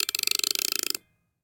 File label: Foley Snow Globe Wind Up Gears Small Crank Christmas Ornament; Holiday